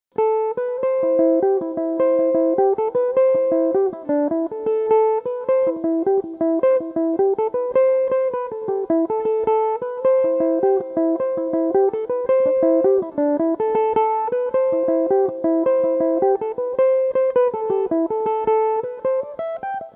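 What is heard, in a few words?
A dorian, mandolin; The Geese In The Bog; Belmuse EM-190 electric mandolin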